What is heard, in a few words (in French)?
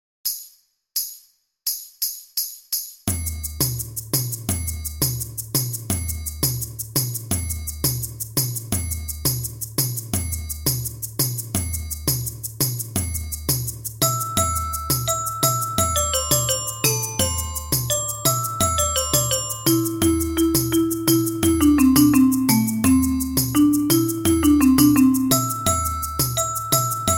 tutti a 170 bpm